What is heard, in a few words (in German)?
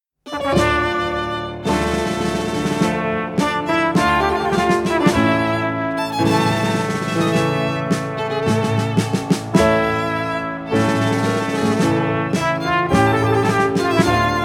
Violine, Flügelhorn, Klavier; Linder-Verlag